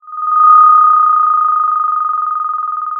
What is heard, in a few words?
Sound of a phone ringing